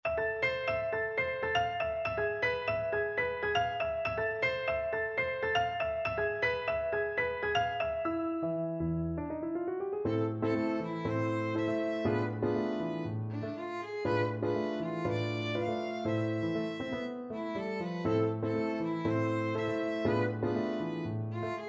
hot sauce salsa violin

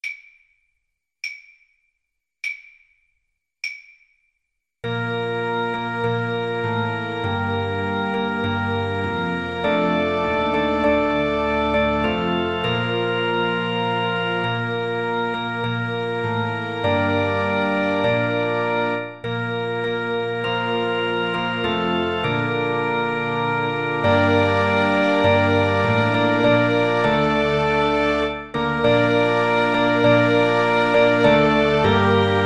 steal away, Flute

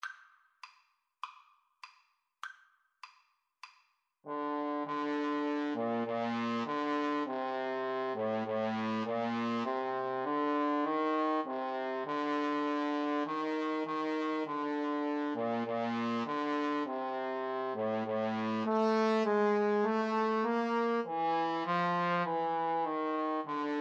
Trumpet Trombone Duet

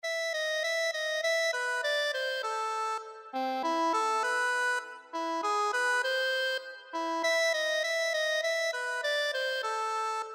fur elise, accordion; beethoven

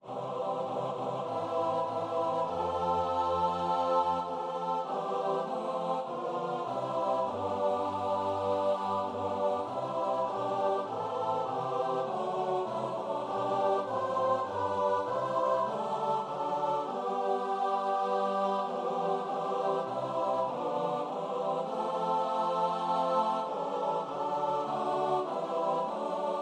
CHOIR

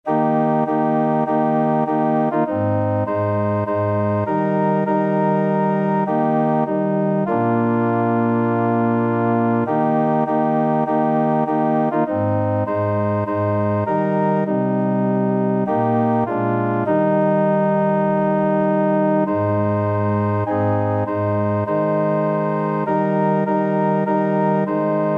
footsteps jesus organ

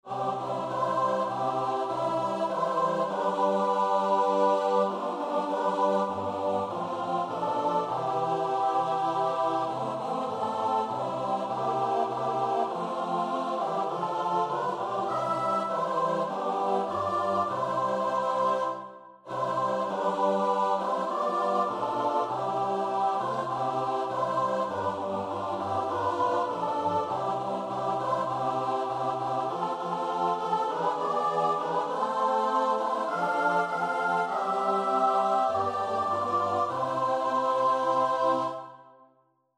choir

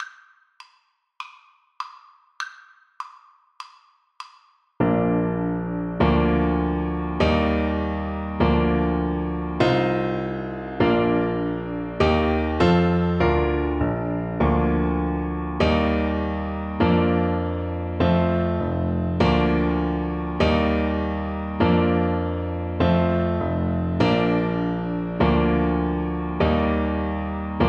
twinkle twinkle, Trombone

